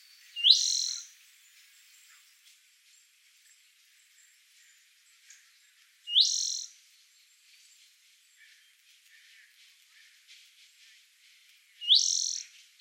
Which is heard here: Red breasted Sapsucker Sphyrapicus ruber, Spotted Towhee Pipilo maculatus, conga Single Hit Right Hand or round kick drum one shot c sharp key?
Spotted Towhee Pipilo maculatus